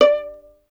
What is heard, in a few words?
STR VLN, Solo Instruments; Miroslav Vitous